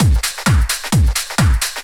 drum 130 dance, drumloops

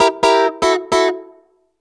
2 STEP 131 SYN, House Side Of 2 Step; USB Soundscan vol.51; AKAI

